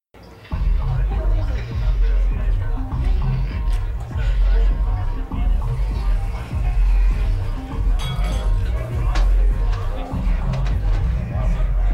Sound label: Lower Cantina Ambience